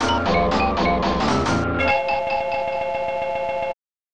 Victory!; Porky Pig's Haunted Holiday; SNES

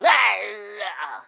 Pecheneg Red Death; Folk Hero

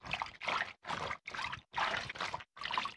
Acid Walker Walk Loop

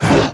Monster Effort Big; Monster George Effort Big

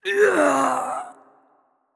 Death; Hero Death B

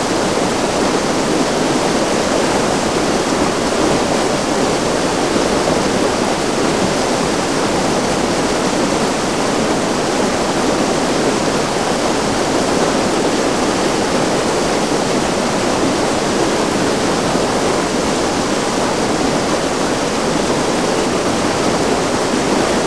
Amb Waterfall; Fur Fighters

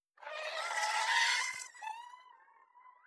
Hydra Vo

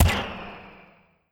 Harpoon attack

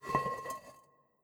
Jar Slide

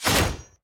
Finalboss Attack

